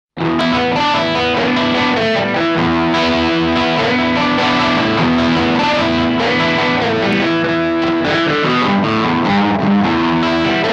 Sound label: Maxon AD9 Ambience Delay Effect